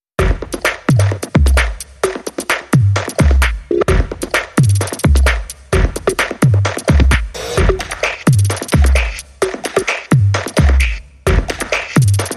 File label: Big Bouncy Beaty